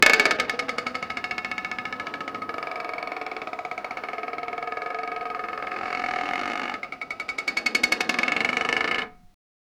a large wooden wheel of, game show

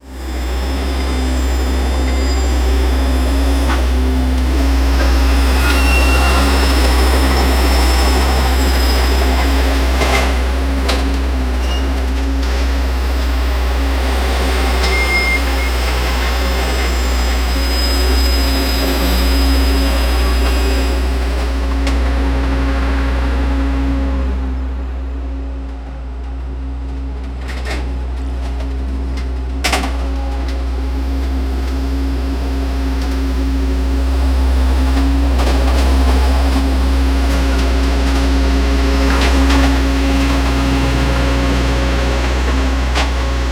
crane trucks and excavato, construction

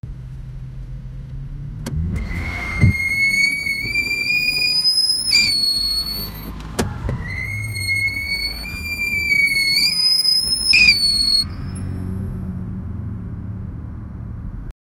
car tyre screeching; car noise

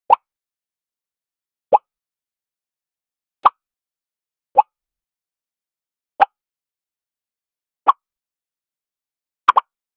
funny cartoon punch sound

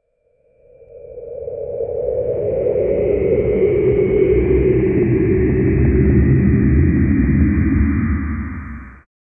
demonic deep sound of a

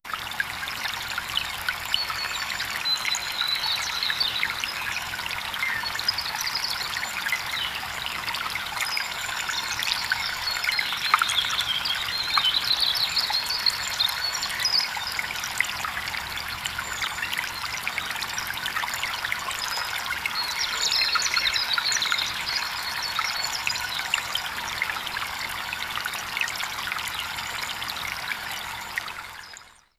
create a wonderful sound, fairy tale

